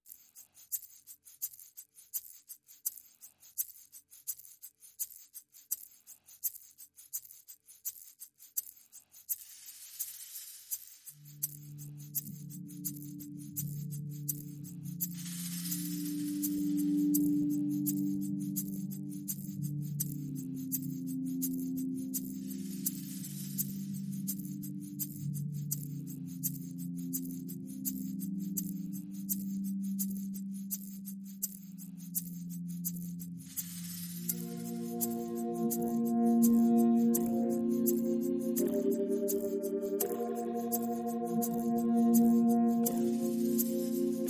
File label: Pads and Perc